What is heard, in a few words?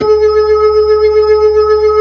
B-3, Blues